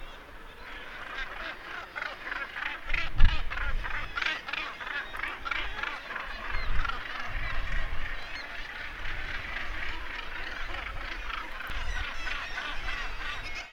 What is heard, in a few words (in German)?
basstoelpel, vogelstimmen